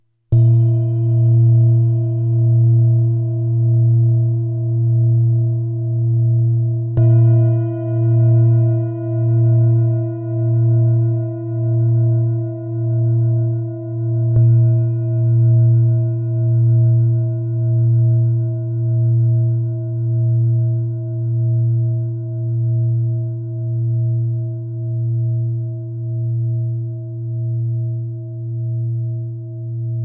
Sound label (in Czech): tibetska misa; zdobena; 24.5cm